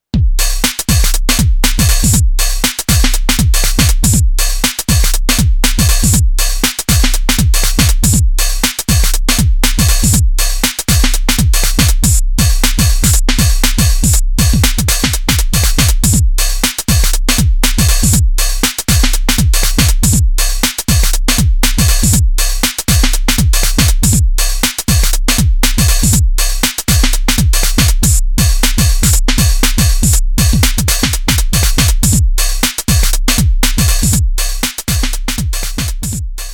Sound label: 2 step garage rythm